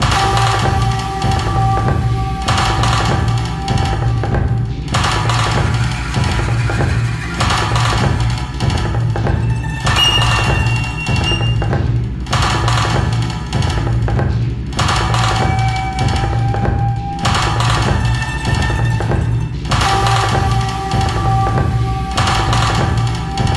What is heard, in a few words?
BGM; Adventure Player; psp